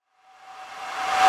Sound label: Reverse Impact; House; FX; Adrenaline Reverse Impact; Reverse Impacts